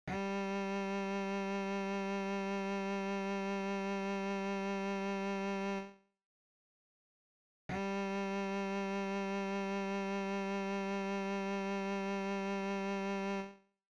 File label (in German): Stimmton G, violaschule